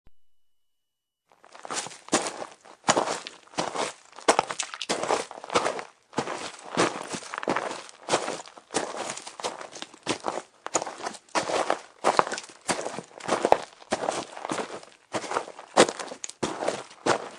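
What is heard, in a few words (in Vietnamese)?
tiếng đi trên sỏi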